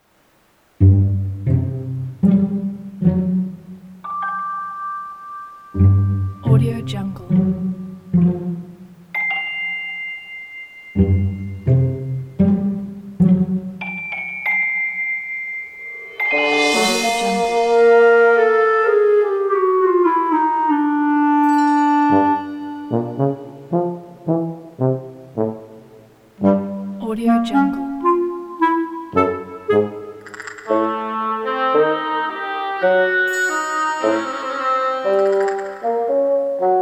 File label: sneaky orchestral